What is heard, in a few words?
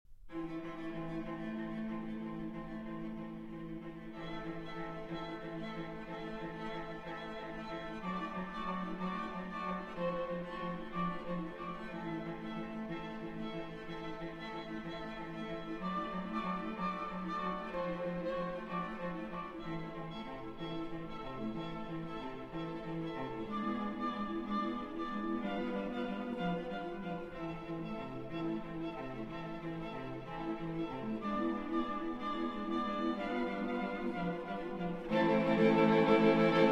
String Quartet